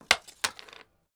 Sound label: Multi Coins Strike Carrom